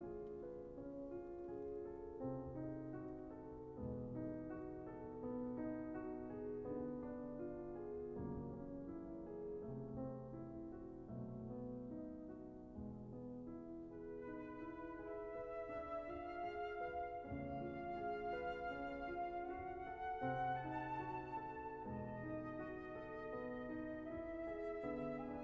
Pianoconcert Adagio sostenuto; Rachmaninoff

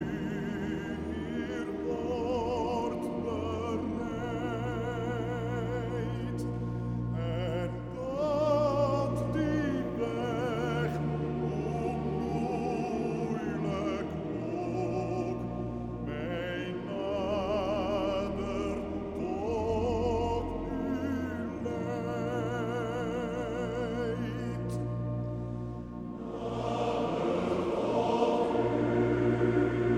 Koor (mannen); Kamper

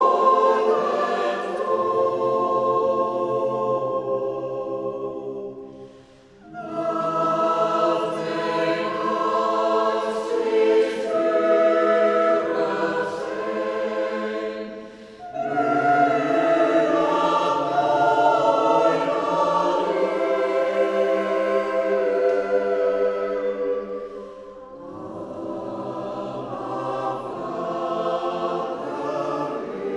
Koor gemengd; Vredeskerk koor

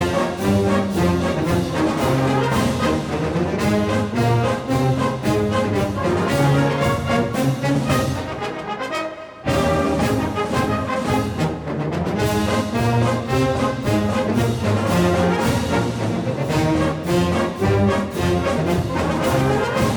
Trompetterkorps; Huzaren v boreel

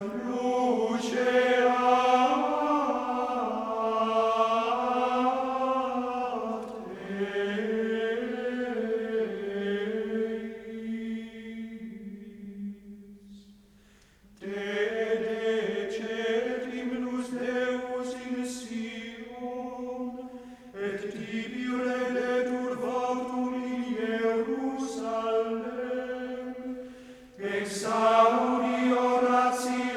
Introitus requiem ( Gregoriaans ); Kyriale

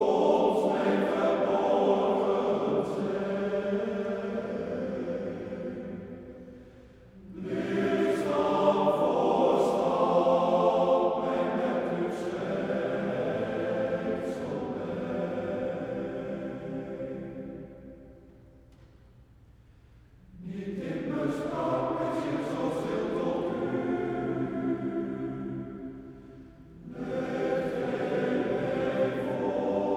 Koor mannen

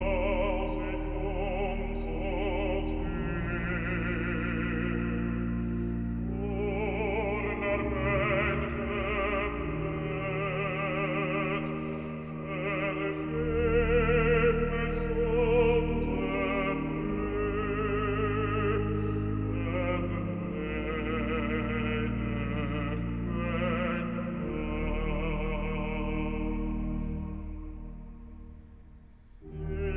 baritonsolo; Als ik kom tot U

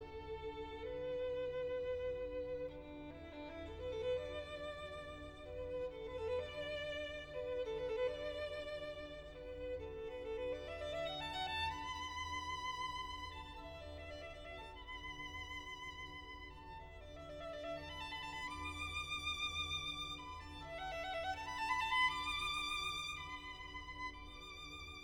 viool; The lark ascending; Williams Ralph Vaughan

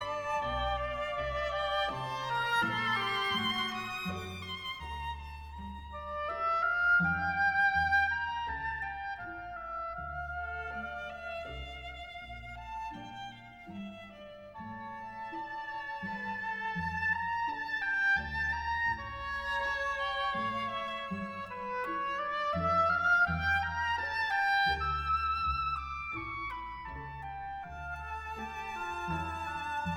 Adagio ( viool, hobo, orkest, d-kl ); BWV 1060

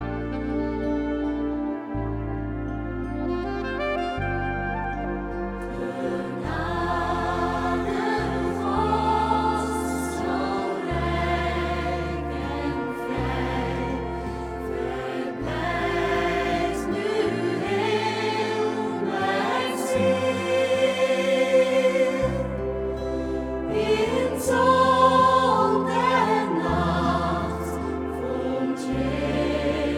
samenzang

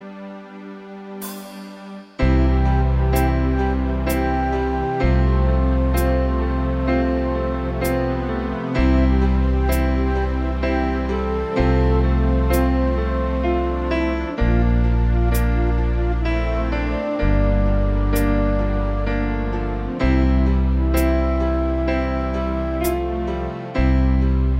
instrumentaal

